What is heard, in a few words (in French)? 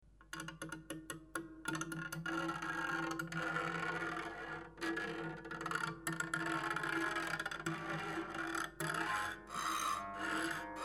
fils cordes metal, harpe